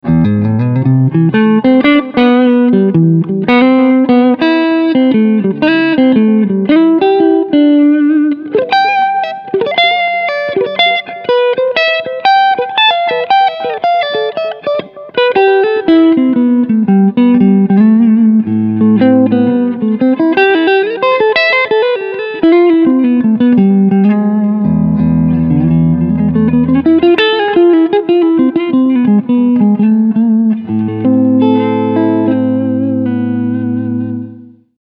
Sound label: Front HB Clean Picking